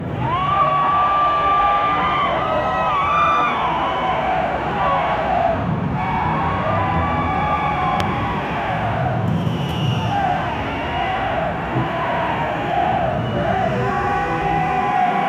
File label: realistic background soun, protest